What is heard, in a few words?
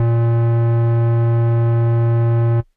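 Air Bass Kawaii A#1; keys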